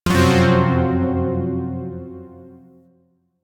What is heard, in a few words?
Shawzin Hirajoshi Chord; Music; Hirajoshi Chord F; Warframe; Zariman Shawzin